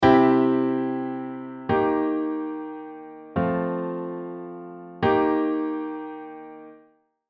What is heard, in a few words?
Chromatic Alterations